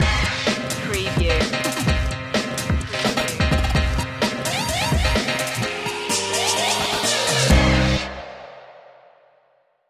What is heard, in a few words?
bass addiction stinger